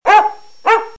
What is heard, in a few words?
MSN Chat dog